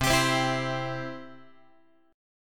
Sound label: C Major, Guitar; strummed; JGuitar